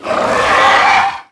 facehugger striking

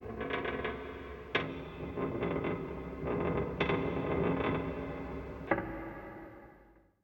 soundscape metal creak; soundscapes

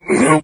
pain; doom3; morgue

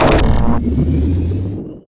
spawner start

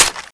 clip out; cso; vulcanus7

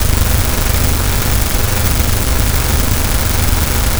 minigun fire; weapons

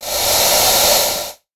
gas

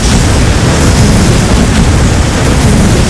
flamer loop, flamethrower; kf2; weapons; trapper

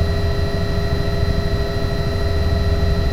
bell internal, heli; wac

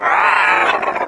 chatter combat, revanent; doom3

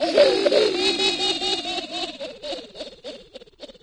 evil laugh; doom3

